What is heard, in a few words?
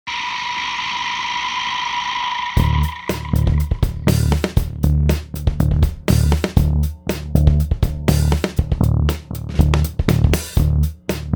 bass